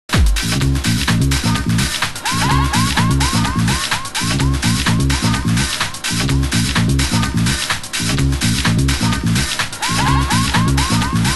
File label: tango